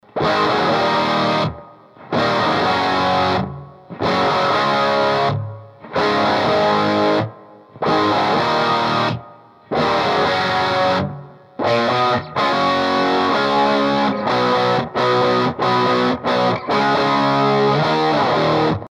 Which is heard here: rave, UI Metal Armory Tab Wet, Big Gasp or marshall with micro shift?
marshall with micro shift